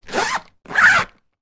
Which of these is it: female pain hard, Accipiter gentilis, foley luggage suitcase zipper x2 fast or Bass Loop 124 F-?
foley luggage suitcase zipper x2 fast